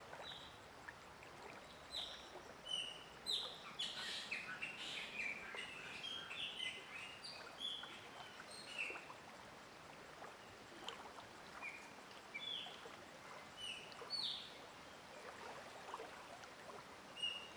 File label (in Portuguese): Passaro cantando na floresta riacho perto; aves